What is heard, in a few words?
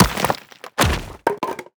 wukong enemy warlock death